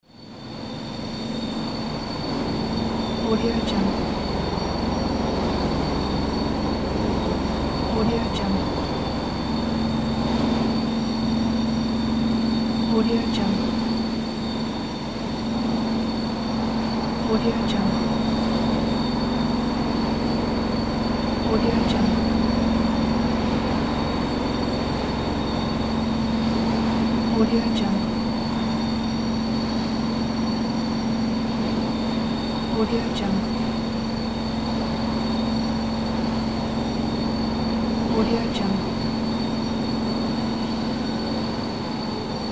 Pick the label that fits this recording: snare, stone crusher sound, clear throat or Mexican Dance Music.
stone crusher sound